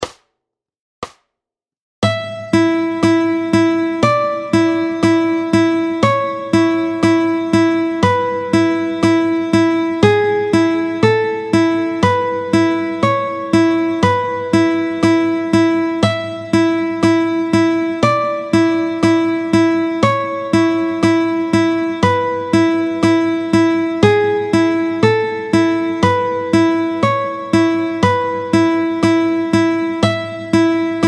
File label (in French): Chanterelle tempo 60, arpeges; le picking les arpeges aux doigts les arpeges au mediator